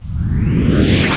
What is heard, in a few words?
slide